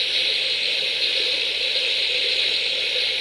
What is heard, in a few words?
loop ambient weird, sci fi